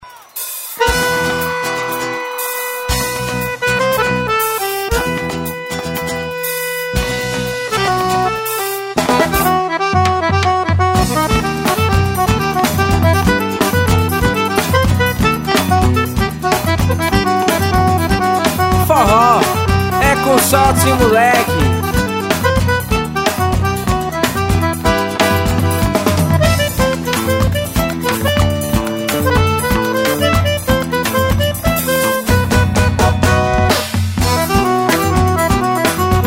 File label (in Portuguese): forro xote